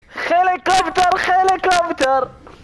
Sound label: helikopter helikopter